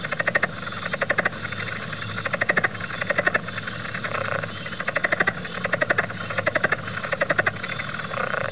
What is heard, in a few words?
southern leopard frog; frogs toads; wildlife